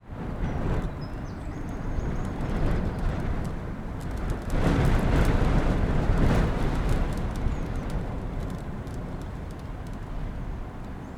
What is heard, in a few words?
Wind, Interior, Attic, Blustery, Sporadic Raindrops; toneglowlibraries